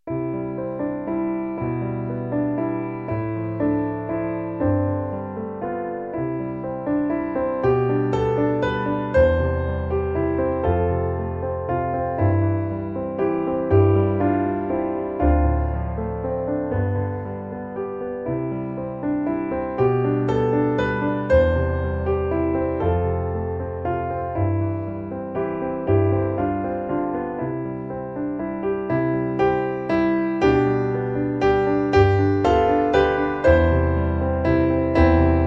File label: PIANO; musica I ESO 2015